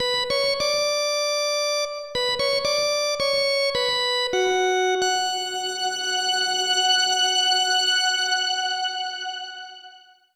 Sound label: Inst Organ